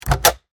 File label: Tow Tractor Door, Industry, Tow, Tractor, Door, Open; Pettibone; Tractors